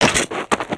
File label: Skullken Walk Run; creatures organic